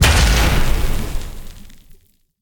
impact; Smite; Vulcan

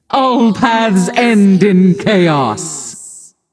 "yay"; smite; voicepack; nuwa

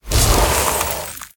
cast ice; smite; god merlin

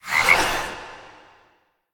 ghost impact; smite; zhongkui

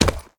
pet footstep; smite; lancelot

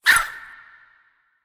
swing; smite; cabrakan

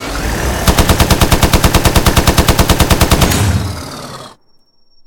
landing; Smite; Zhong Kui

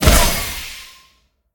projectile; smite; tiamat

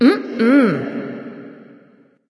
disagree light; smite; voicepack; hel

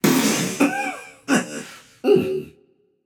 clear throat, voicepack; smite; jorm

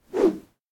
fire; smite; hades

